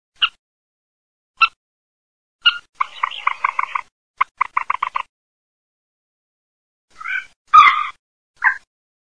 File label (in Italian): GALLINELLA